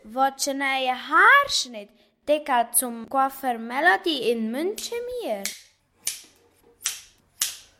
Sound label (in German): Coiffeur Melodie; BTM Bruettelen